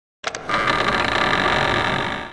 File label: bossdoor die